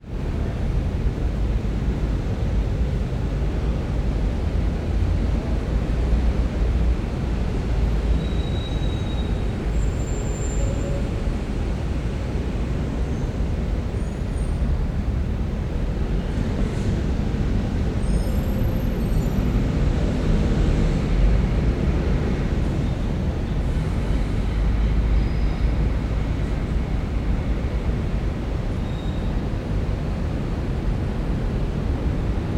ambience city london uk victoria tower gdns near lambeth bridge traffic helicopter